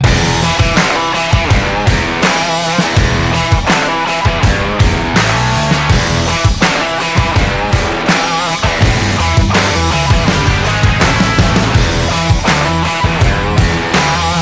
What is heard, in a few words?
Stadium Stomp